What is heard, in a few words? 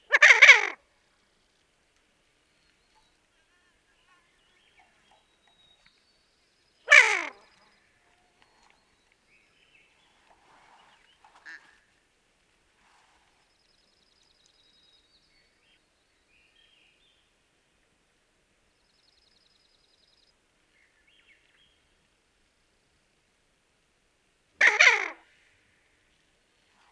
Barrows Goldeneye Bucephala islandica; waterfowl